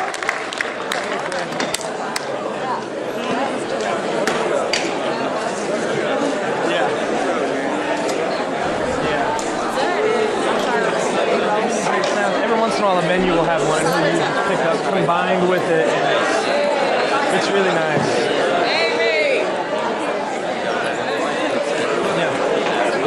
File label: crowd after noise; the culture center theater - charleston, west virgi